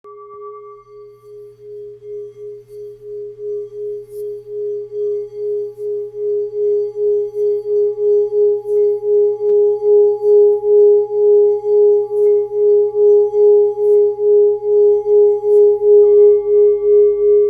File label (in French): Bol 13 cm